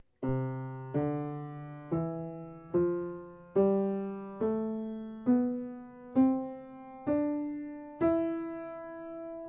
real scale

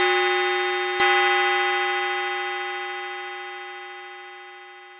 chime

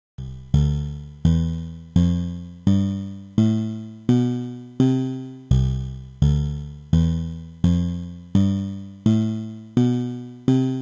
String width octave; DE1 SOC; DSP; Synthesis

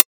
hi hat

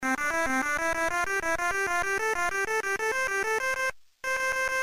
start music

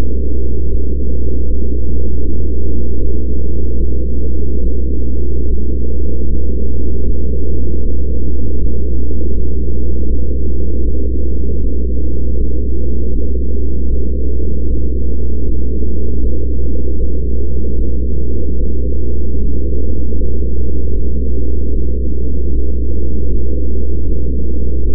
ecg noise

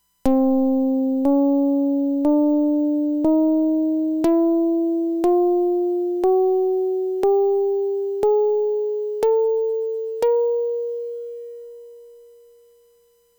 plucked gauss; PIC32; Sound synth

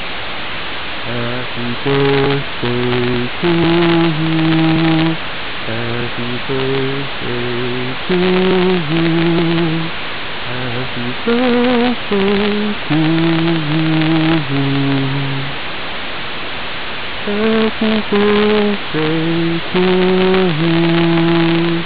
gaussian

